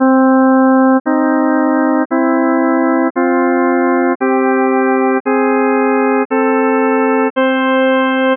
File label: C4-C4 C5-C5 Consonant